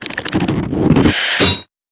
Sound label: clattering noise, Cartoon